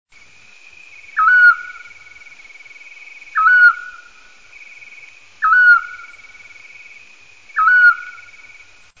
canto autillo; aves campo

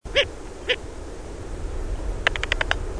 canto lechuza campestre, aves campo

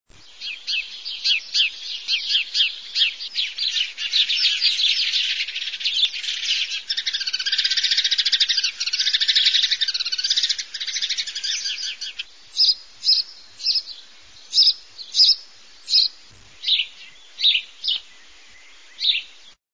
canto gorrion comun; aves campo

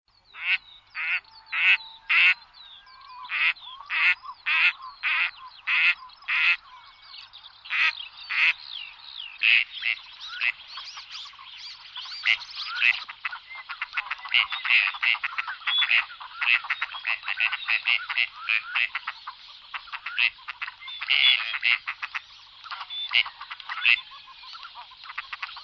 canto Anade Friso

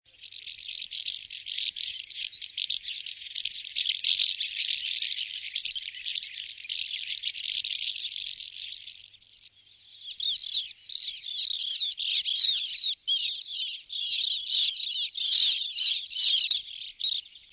canto Avion Zapador

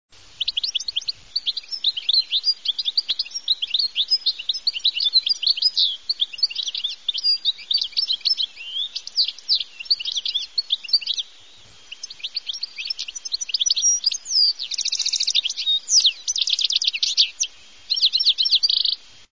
canto jilguero, aves campo

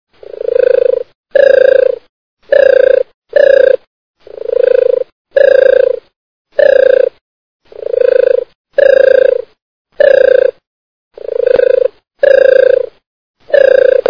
canto Tortola Comun

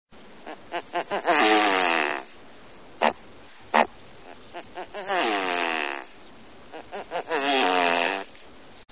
canto avutarda; aves campo

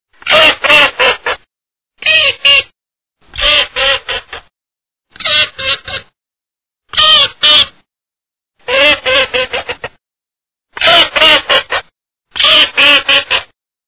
canto Cerceta Comun

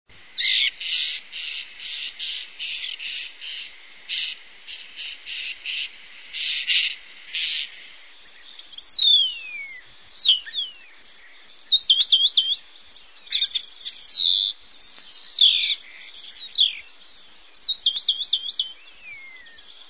canto estornino negro, aves campo

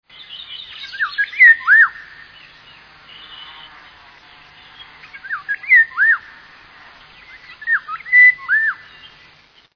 canto Oropendola

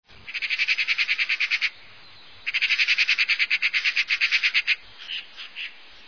canto Urraca

